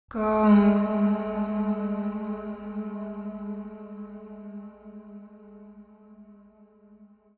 130bpm Am, Vocalpack; Vocals

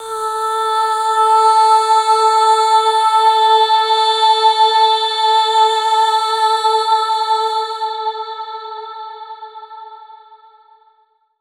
Ah Vocal - Fm 60Bpm (WET); Construction Kits; VOCALS; Ghosthack; SkyRealm; Kit 04 - High Rock View

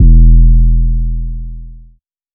808 - E; 808s; Cymatics; Cobra Hip Hop Sample Pack

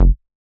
Squarehead Bass F#

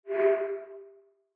cursor selection; PremiumBeat SFX

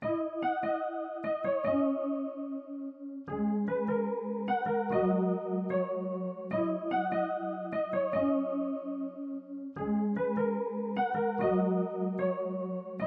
148 BPM G# Min Plucks; Stems; Cymatics; Good Vibes

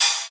OH; Riddim; One-Shots; Hats and Cymbals; Ghosthack; Riddim Essentials Volume 2

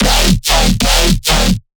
Bass Shot D#, Dubstep; One-Shots; Shredder; Ghosthack Advent Calendar 2021 - Day 16 - Dubstep Basses

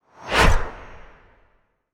Whoosh; Whooshes

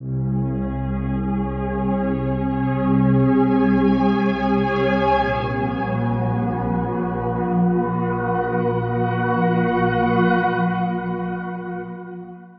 Pad; Dubstep

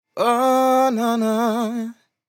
Ad Lib Dry, 95 BPM A Min, Vocal; Cymatics; Euphoria Vocal Sample Pack; Ad Libs

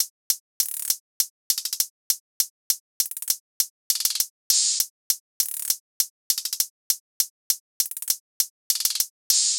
Drum Loop - 100 BPM Hihat, Midtempo; Deadlock; Cymatics; ANALOG EVOLUTION Beta

